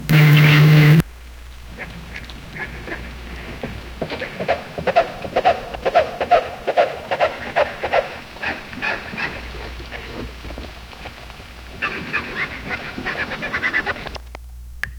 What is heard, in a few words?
a weary fugitive runs thr, barking